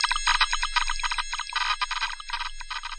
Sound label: ufo control panel, electro FX; UNUSUAL KITS and FX; Atomhard Electro FX